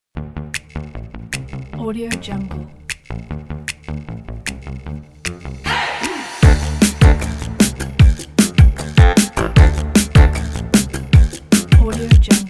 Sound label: fun upbeat; prostockaudio